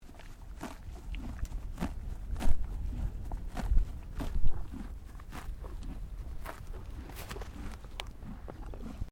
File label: Animal Horse Quarterhorse Eating; Various domestic horses; Animals